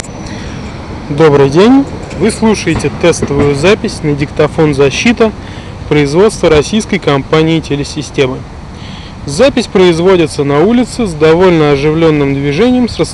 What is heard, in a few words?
Outdoor